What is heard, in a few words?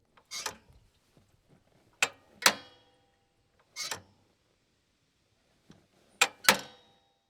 metal gear